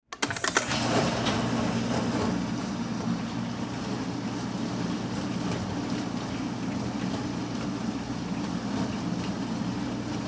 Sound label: dishwasher, machine sound effects